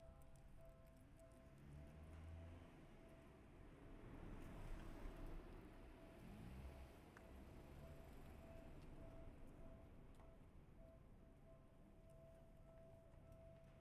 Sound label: Binaural; Field recrding walk